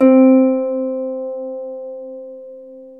Troubador Harp

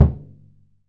Surdo; E-MU Producer Series Vol. 2 – More Studio Essentials; Composer; Surdo Pallet

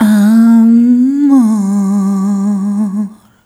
Rhythmic G

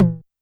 Tom Analog, YAMAHA RM1X

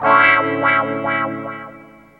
OFF GTR, Funky GtrLicks; E-MU Formula 4000 Series Vol. 1; Hip Hop Nation

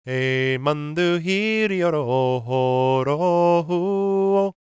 GAELIC, World Voices; ILIO; Vocal Planet

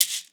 CABASA; Xsample VOL-9

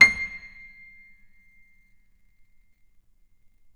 PNO FF; Synclavier Sampler Library

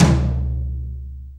Brush Tom; Sonic Foundry (Sony Creative Software); Drum Tools; Brushes

